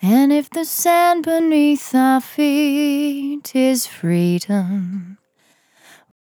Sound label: On A Star 65bpm F, Vocal; Zero-G